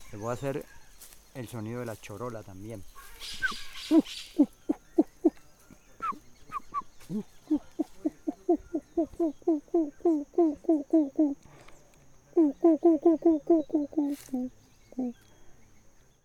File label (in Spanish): Sonido de la chorola